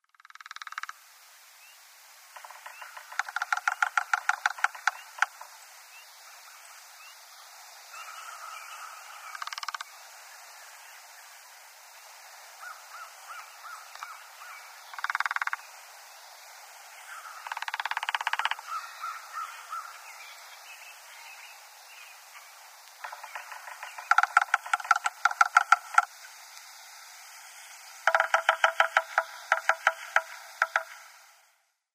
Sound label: Yellow-bellied Sapsucker drumming